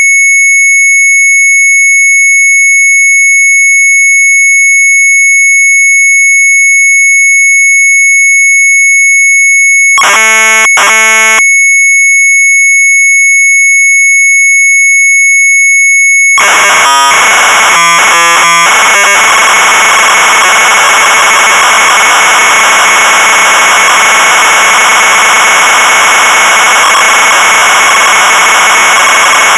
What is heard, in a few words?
MZ800 Tape, sharp; BOSSLANG; retromelsarchive